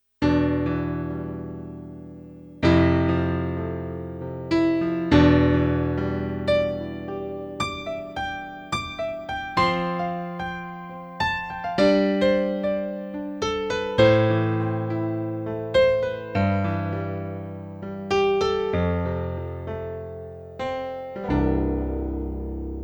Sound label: Piano